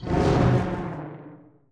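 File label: manticore damage up; monster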